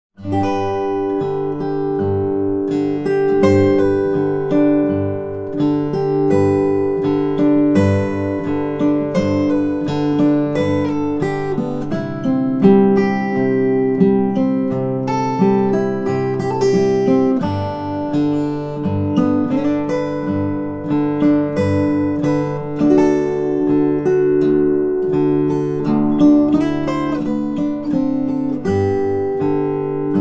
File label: guitar